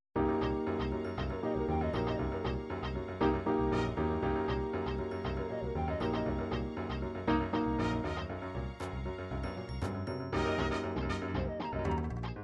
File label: disco, midi